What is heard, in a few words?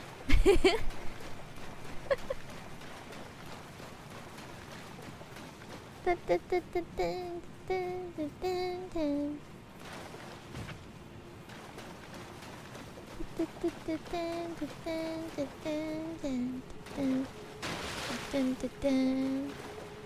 average cute nephilim noises